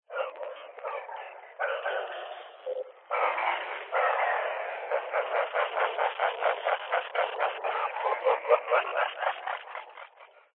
ghost evp spirit box eerie male vocal noises into laughter glitchy; recorded lo fi